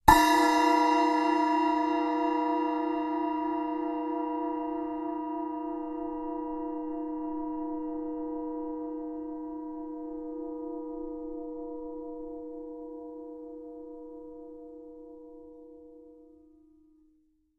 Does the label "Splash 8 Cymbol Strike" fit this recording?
Yes